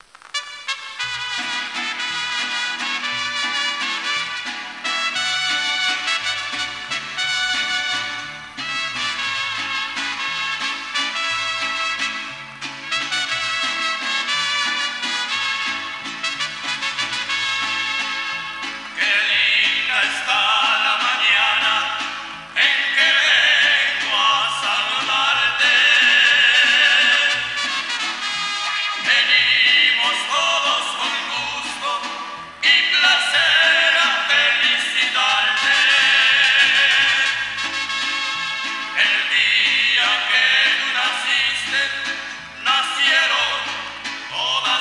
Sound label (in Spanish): Las Mananitas (Full Mix Vocal)